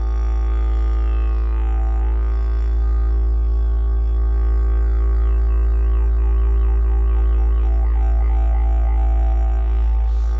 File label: Degeridoo; Okunoka